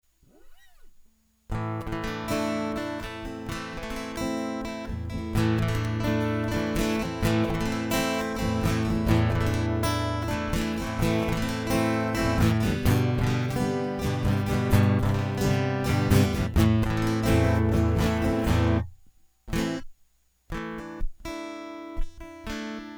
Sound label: Guitar Interlude; hyper terrestrial; La tin gold